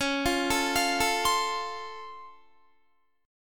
C sharp Augmented Major 7th C sharp down Guitar, chord; C#+M7; arpeggiated; JGuitar